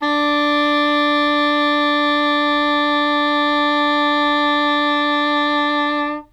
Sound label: English Horn D3; Standard Instr